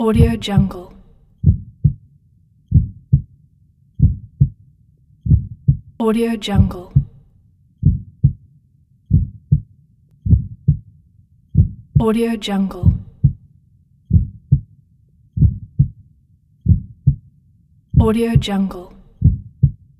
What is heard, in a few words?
heartbeat